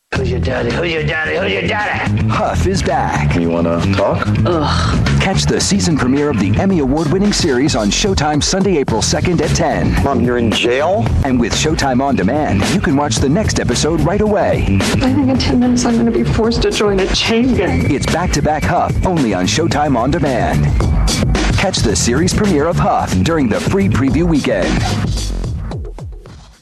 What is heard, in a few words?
huff